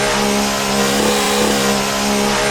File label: in CMED, F1; Japan; AOS F1 2022